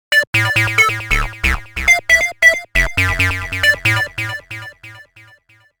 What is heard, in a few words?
Trebble Line